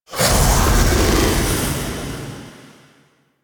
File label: Ground Slam B; Warframe; The New War; Archon; Nira; Abilities